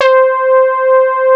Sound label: SB HORN; Wizoo - Powered Nord; STEREO LAYER